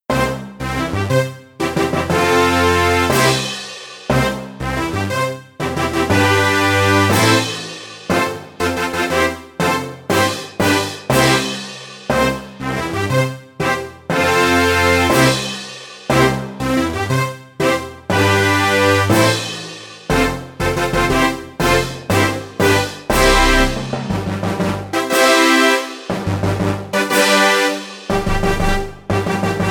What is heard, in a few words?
Royal Announcement Instrumental